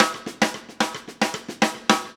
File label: Snare w Rim; ACID MUSIC